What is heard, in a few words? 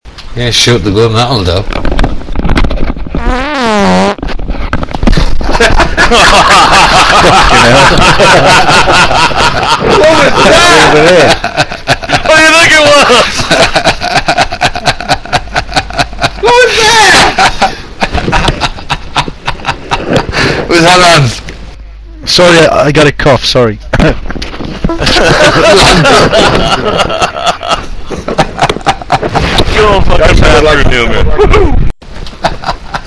phantom raspberry blower